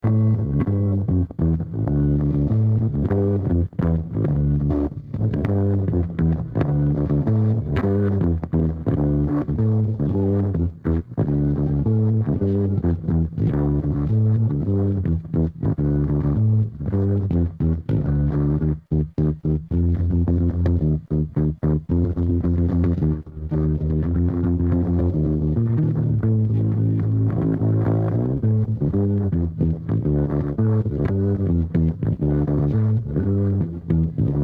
bass

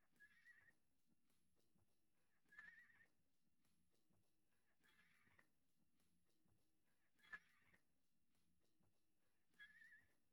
piano

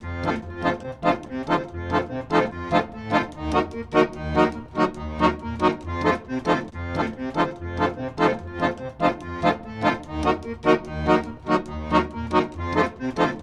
lead

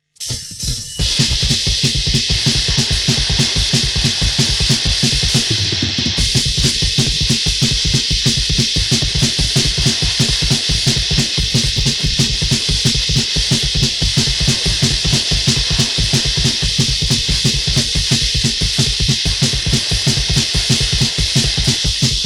drums

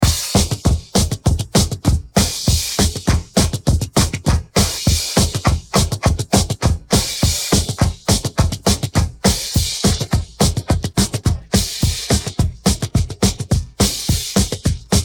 drums

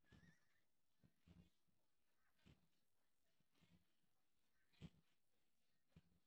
guitar

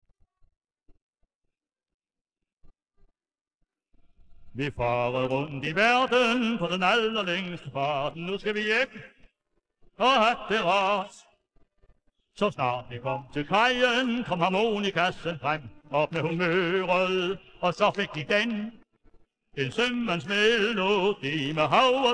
vocals

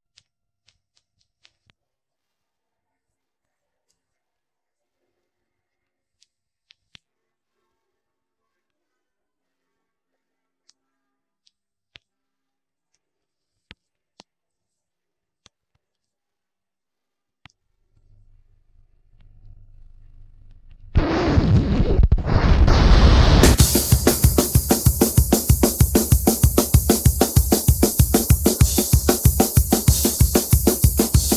drums

